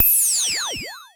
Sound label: Klone Dual-Percussion-Synthesiser; EFX